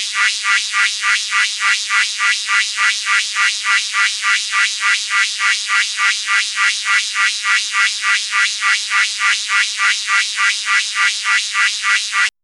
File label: future robot